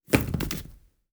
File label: bodyfall Concrete Generic Feet Mid Mono Med Impact; Red Libraries